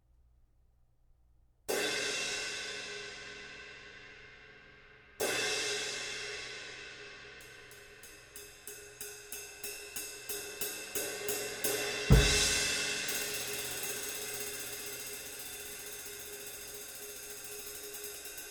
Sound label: K Con Proto; K Zild